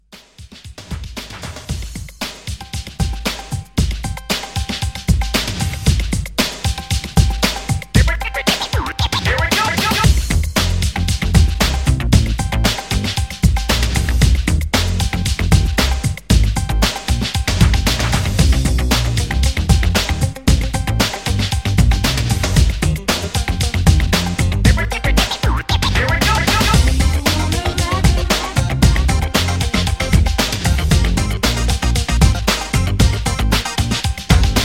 80s Re Drum 115

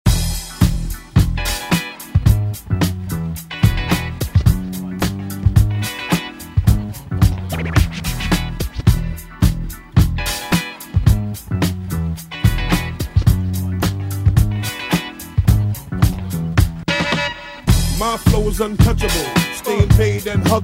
Straight Kutz; DJ Mhark

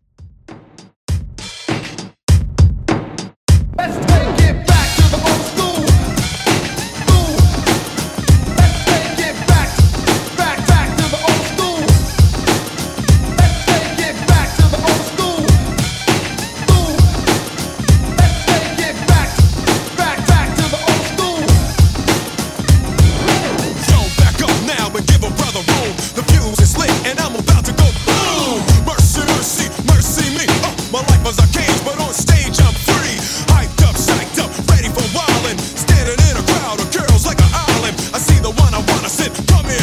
Hype Classic 100Bpm

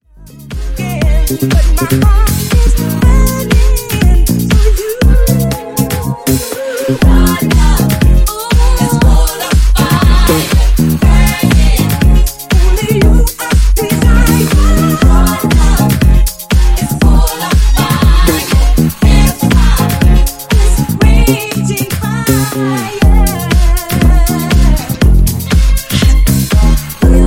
Funk Nu Disco House, 120 Bpm

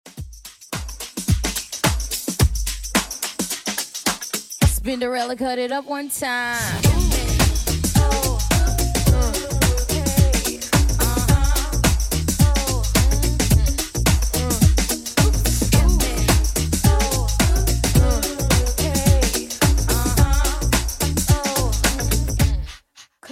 90s Redrum 108bpm

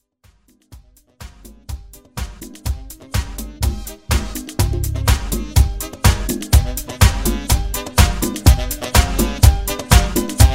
Latin Redrum

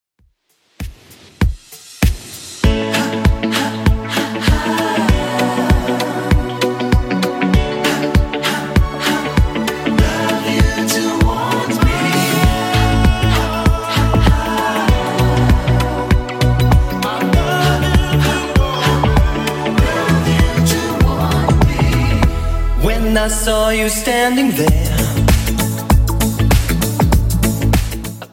90s Redrum 98bpm

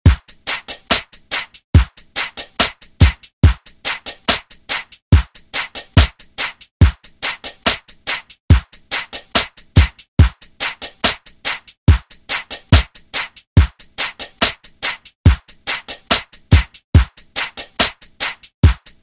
Funk Mashup 71Bpm

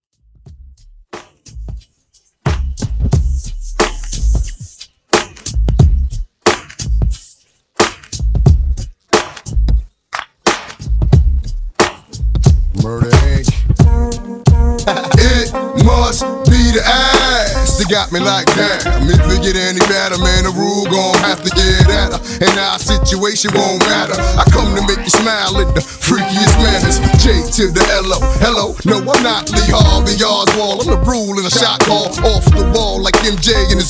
Re Dru

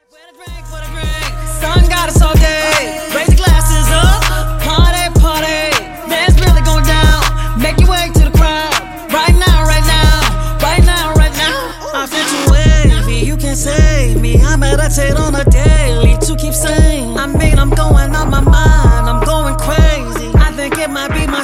Funk, 80Bpm

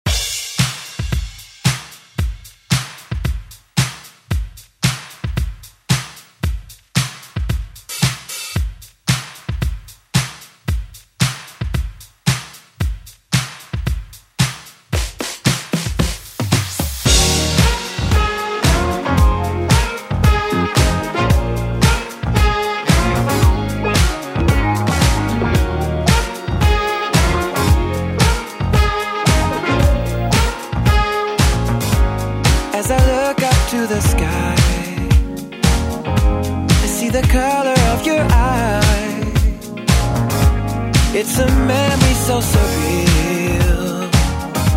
ReDrum; DJ Mhark

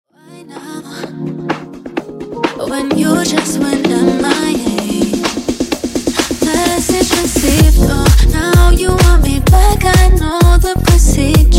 Funk Clean 128 Bpm

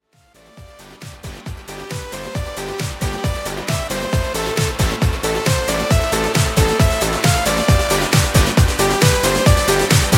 135 Bpm; Redrum

